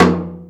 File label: Toms Real